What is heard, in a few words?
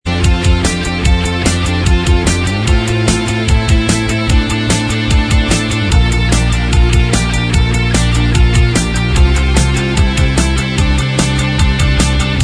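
instrumental, backing track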